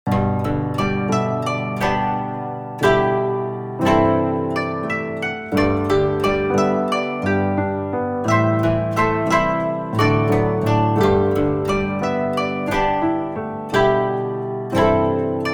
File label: Puzzle BGM; Juufuutei Raden's Guide for Pixel Museum